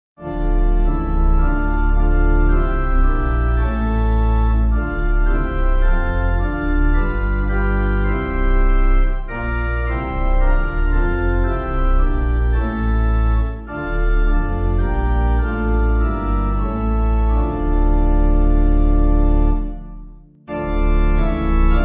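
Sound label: Pipe, hymn backing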